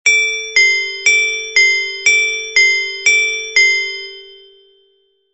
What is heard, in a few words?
glockenspiel sound